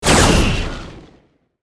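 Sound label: quantum torpedoes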